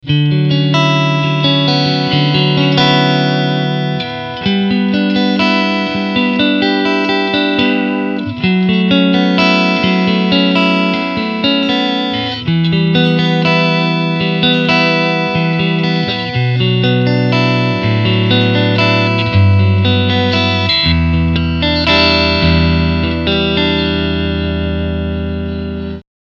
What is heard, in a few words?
Cleanchannel Cleansound